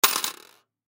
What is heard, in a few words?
Coin Quarters Drop; Drawer; Foley; Money; Objects; Blastwave